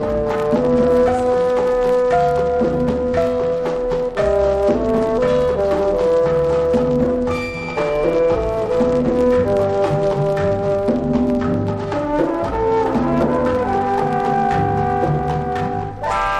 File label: jungle drums